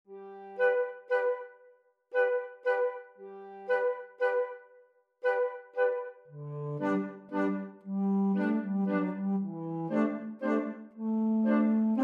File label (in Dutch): fluit; L-Elephant; Saint-Saens; Carnaval des Animaux